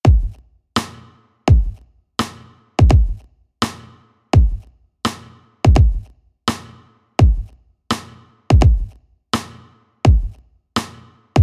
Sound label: Blow Your Horn